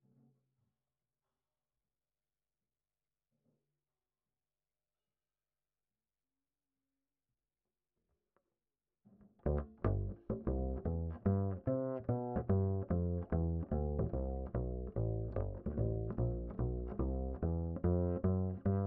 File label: Bass Line